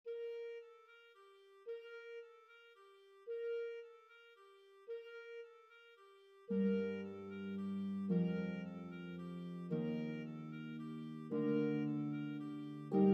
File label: Bb Clarinet Pedal Harp, Duet for Bb Clarinet and Pedal Harp; Carol of the Bells